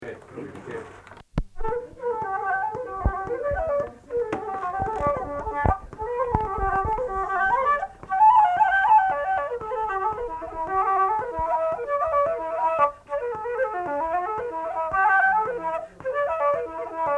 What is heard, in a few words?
Jennys Beaver Hat flute